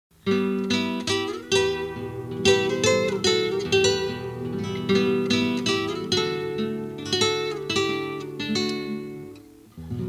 Twin Guitars

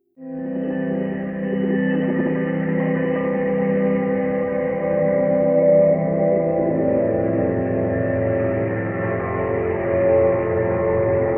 music box

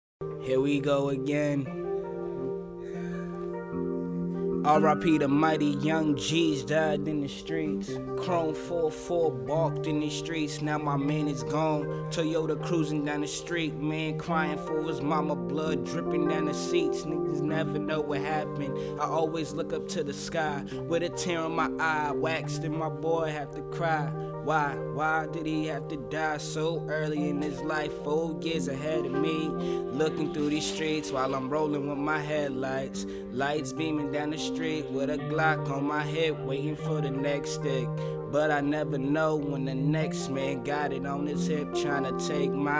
rap

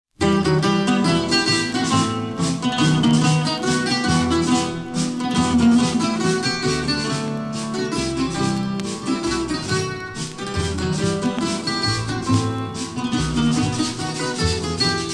mazurka

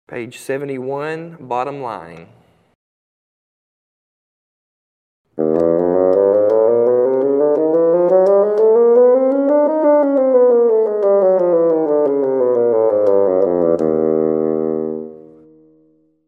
Bassoon; Set II - Bassoon 4. Page 71, Bottom Line

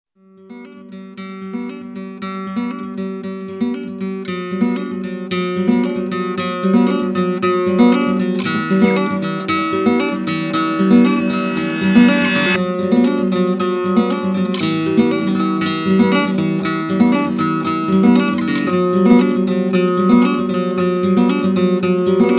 instrumental

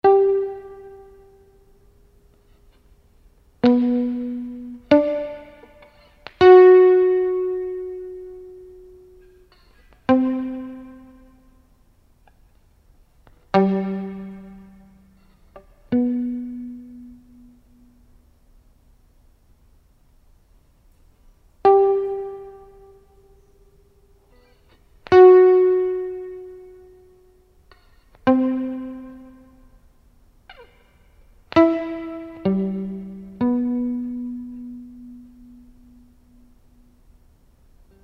Bushfire Noises

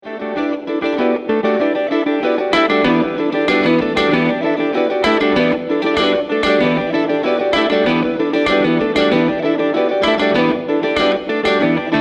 Electric Counterpoint I. Fast

